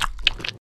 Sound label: slosh; Lewd SFX